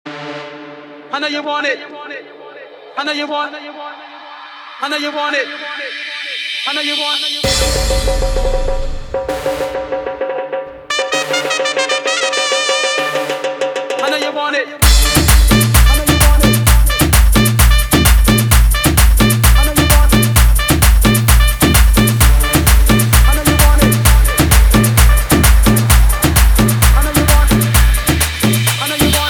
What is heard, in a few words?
intro outro 130 bpm